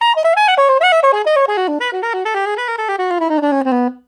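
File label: jazzy down, soprano sax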